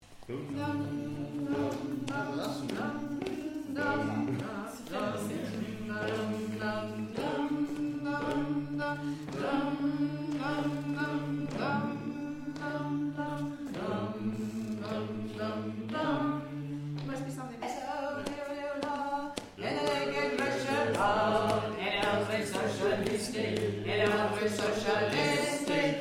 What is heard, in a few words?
Bass